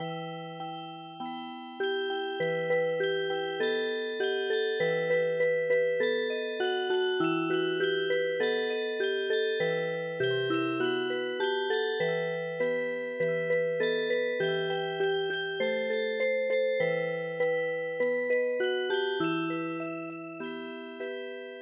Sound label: Music Box, traditional; The Old Chishomlm Trail